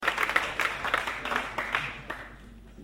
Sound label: Clapping